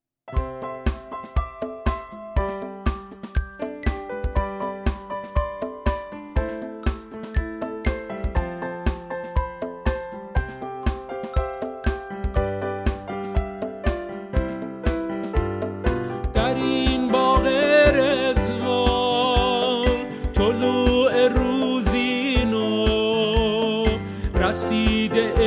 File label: vocal; allahuabha